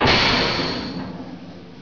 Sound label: door move